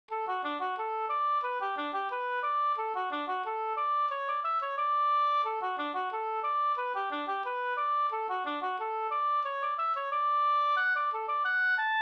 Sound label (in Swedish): engelska; fr Nattraby